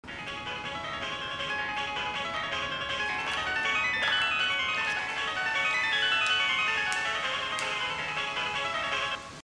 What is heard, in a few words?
Ring